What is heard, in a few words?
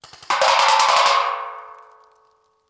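Paintball Gun Impact; Sound Ideas; Guns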